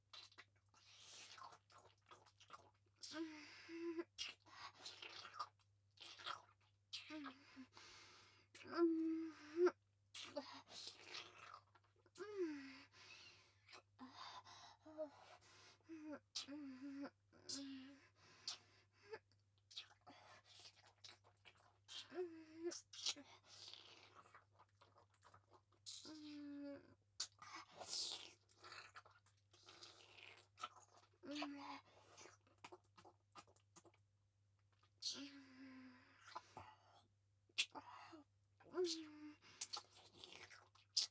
ディープキス

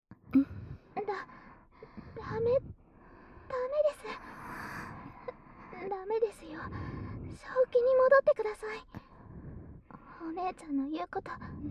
両耳舐め乳首弄り, ASMR; 両耳舐め乳首弄りでオナホま○こに腰振り

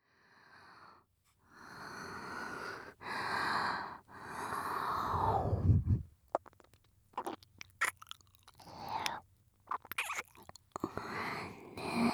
耳舐め（ハード）; 白ギャル; ホワイトデー

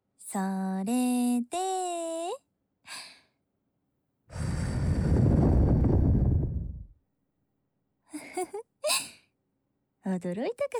耳吹き（右）; 宅飲みASMR; 女友達の家でだらだら～201号室管理人で近所のお姉さん～

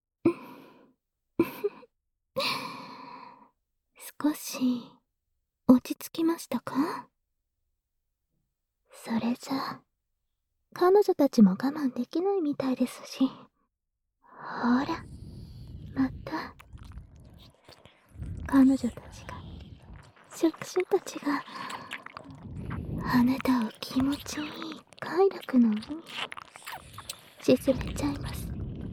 全身ぐちゅぐちゅ舐めマッサージ, ASMR; 触手エステ

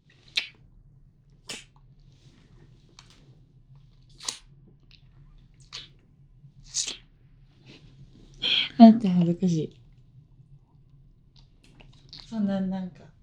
濃厚なキス

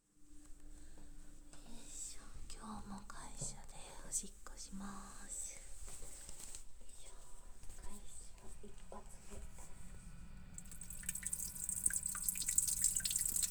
会社のトイレでオシッコ！; １回目！; 実演音声

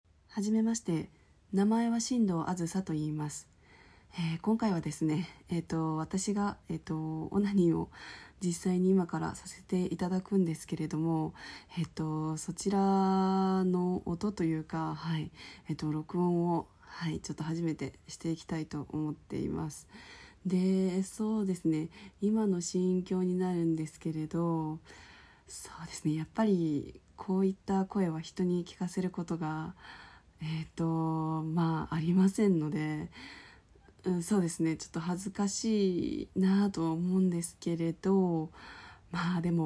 初 ガチ 実演 オナニー, 低音 オホ声 の 絶頂 オナニー; THE FIRST ORGASM; 「クリちょっと触っただけですぐイきそうになるの…」

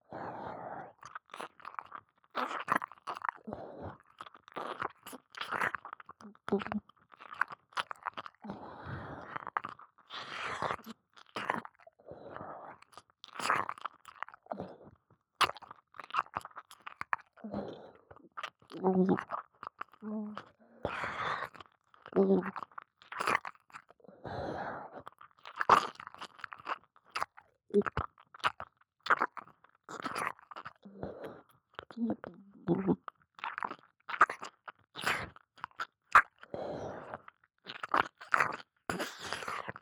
後方左 ぐぼぐぼ耳舐め; ネモナ

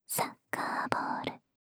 サッカーボール, ASMR; 【無声音ASMR】シャッフル睡眠法で眠ろう【バイノーラル音声】; シャッフル睡眠ランダム再生用

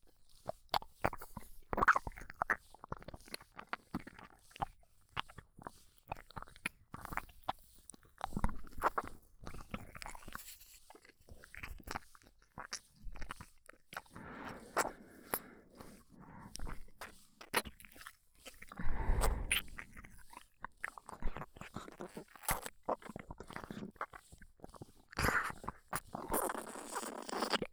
耳舐め 耳舐め手コキ; アドリブ集; クーデレ年上偏愛メイドのご奉仕生えっち

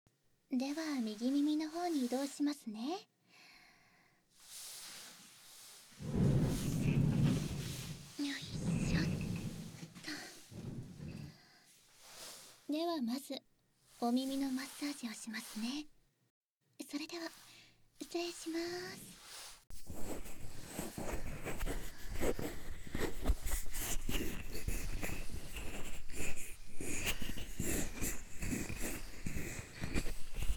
右耳のトリートメント; イヤートリートメントサロン・オレイユ ～一ノ瀬編～

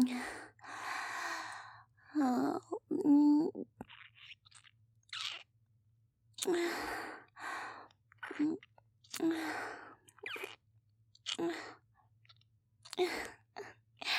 キス; 【マゾハーレム】

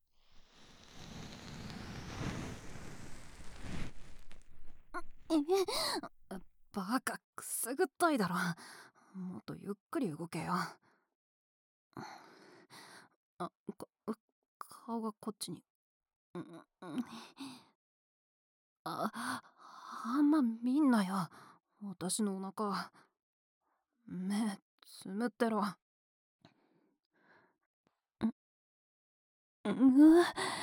【右】浅め耳かき, ASMR; ヤンキー先輩は僕のお耳に目をつける

